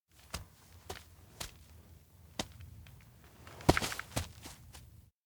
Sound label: steps outdoor run jet collapse; anemoi